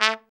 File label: TRUMP; Trumpet